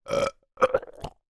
human male burp frothy soda

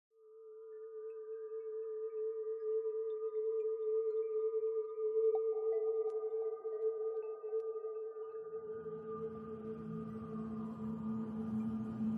soundscape dark eerie science fiction airy alien; Audio Mangler

